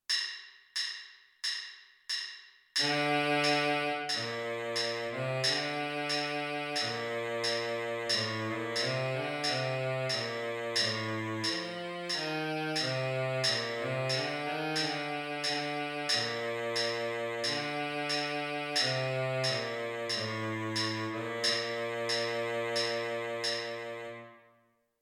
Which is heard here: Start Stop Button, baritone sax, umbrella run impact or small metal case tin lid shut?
baritone sax